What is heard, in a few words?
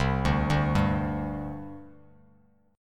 C Suspended 2nd, C, down, Bass Standard; Csus2 arpeggiated